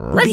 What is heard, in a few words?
dog small bark